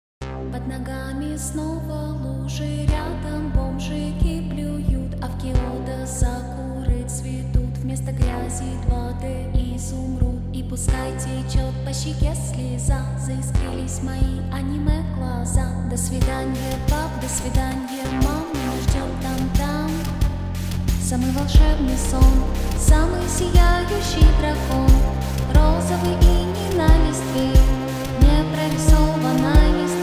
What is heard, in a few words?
8bit slowed x reverb